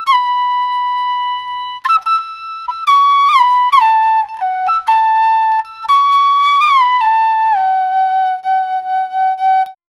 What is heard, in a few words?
cartoon style snake charm; traditional